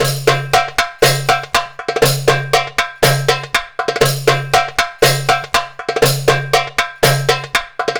PERLP, ArabianTraditions; USB Soundscan vol.43; AKAI

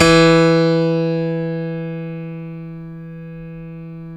StereoGrand, Keyboards

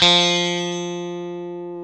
guitar, e-guitars

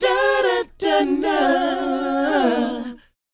DOWOP G#4, Vocal Planet, R&B & Dance, DOO WOP; ILIO

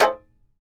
tar; Roland - LA Composer vol. 3

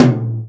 tom, Real Drum Kit, jungle kit

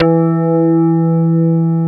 WOOD BAS

